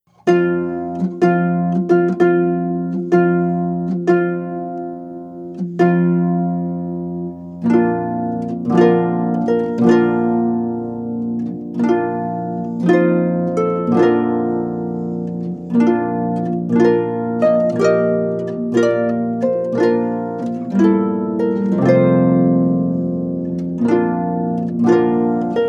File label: Bridal Chorus, harp; Lohengrin; Wagner